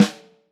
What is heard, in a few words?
Vintage Snare Drum; Q Up Arts; Johnny.C's Vintage Snare Drum; 7X14